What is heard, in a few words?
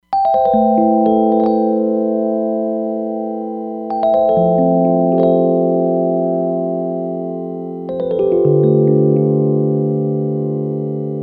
Bi Phaser, Roland DEP-5; Factory Patches